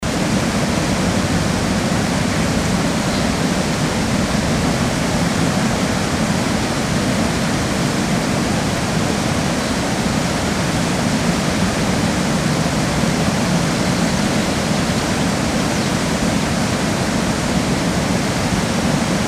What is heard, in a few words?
waterfall atmo distant